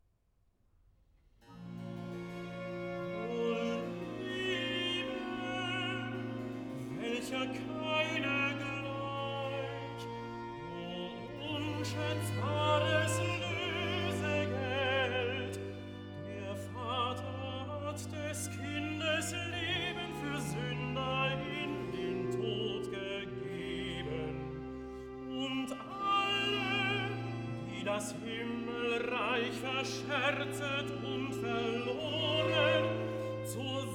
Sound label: Recitativo (Tenor); Cantata, BWV 174 'Ich liebe den Höchsten von ganzem Gemüte'; Bach; Long Melford; Whit Mon